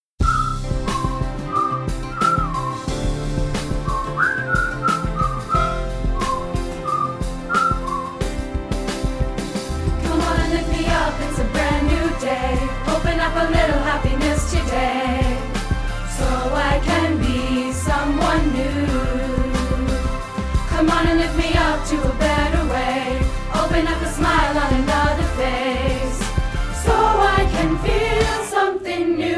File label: COKE OPEN ALTO